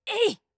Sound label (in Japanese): 攻撃